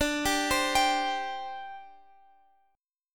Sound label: C Suspended 2nd D